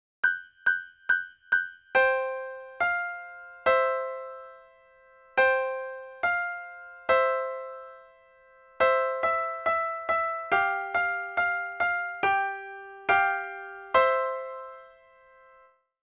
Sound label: Duet; Hot Cross